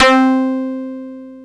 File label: RUFF BASS C5, Yamaha TX-81z